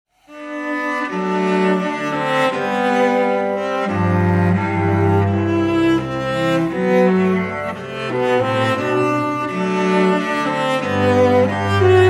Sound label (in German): celloduo, sarabande; hofmeister verlag